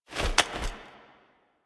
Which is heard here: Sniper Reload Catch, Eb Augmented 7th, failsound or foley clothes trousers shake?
Sniper Reload Catch